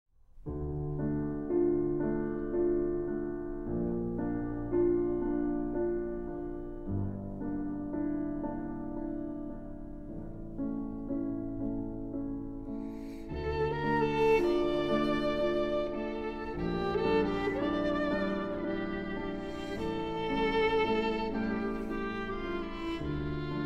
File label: Version For Violin and Piano; Schubert Schwanengesang, D. 957 No. 4, Ständchen